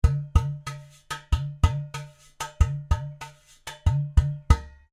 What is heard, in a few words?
UDU